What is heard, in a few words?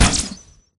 needler shot